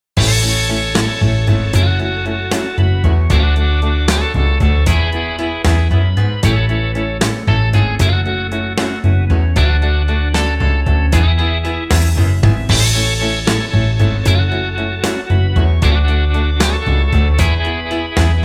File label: Jukebox Oldie instrumental